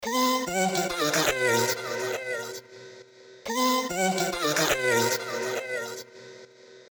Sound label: vocal